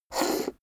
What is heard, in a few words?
poker chip flick slide table long